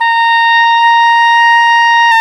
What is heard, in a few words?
oboe, Oboe Brth